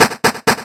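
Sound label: Dungeon Stair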